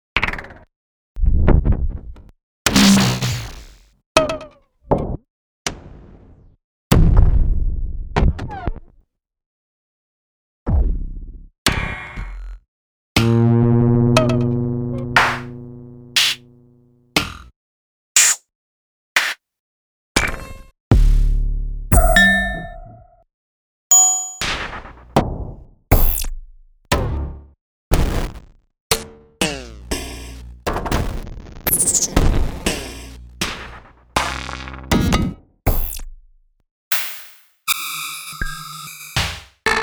circuit malfunction

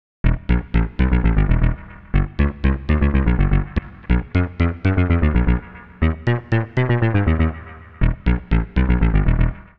black pattern seq

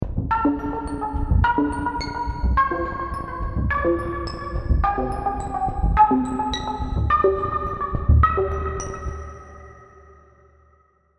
serum seqs arp; chiqui audio

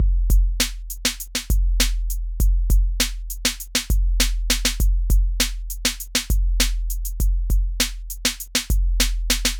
hiphop drum

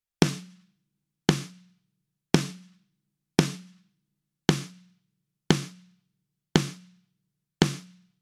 snare longer release

